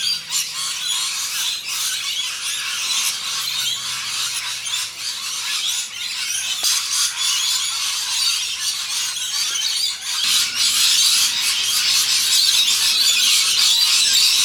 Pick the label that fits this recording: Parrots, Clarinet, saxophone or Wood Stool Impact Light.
Parrots